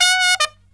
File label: TRUMP, Killer Brass Riffs